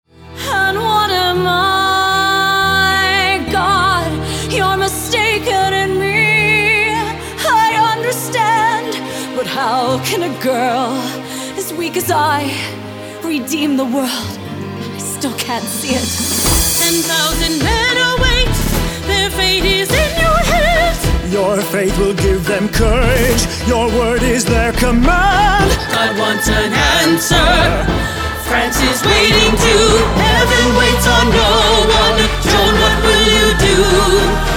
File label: voices